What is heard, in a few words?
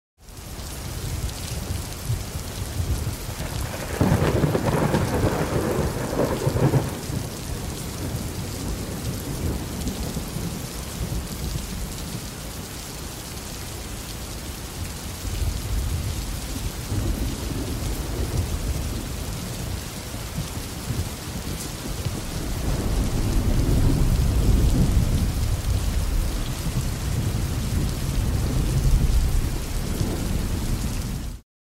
Urban thunderstorm with heavy rain